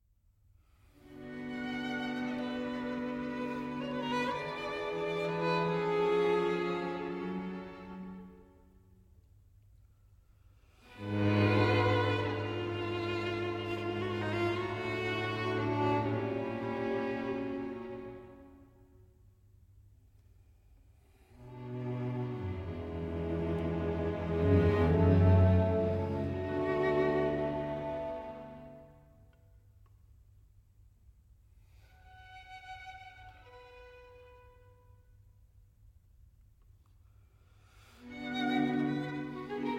String Quartet in A Major I. Andante Espressivo - Allegro Molto Moderat; Op. 41 No. 3; Allegro Molto Moderato